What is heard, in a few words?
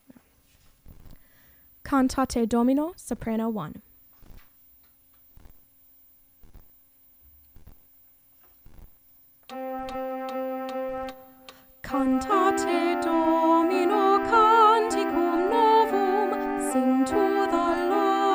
cantate domino soprano